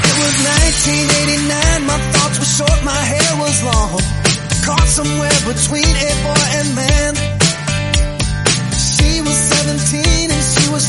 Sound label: 114bpm Dm